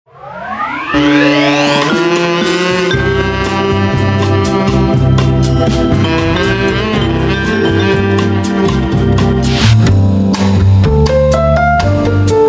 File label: Smooth Jazz Mix; office